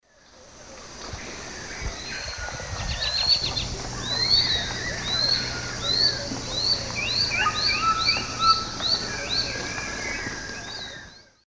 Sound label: Micromonacha lanceolata, song; S. America; Eastern Lowlands